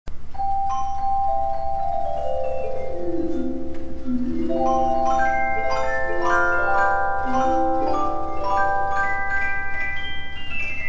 Harfe

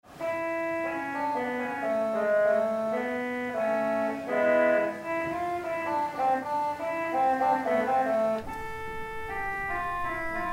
Vox humana

